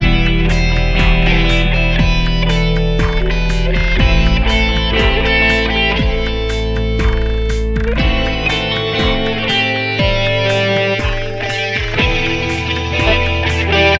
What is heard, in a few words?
natural minor accompaniment no F0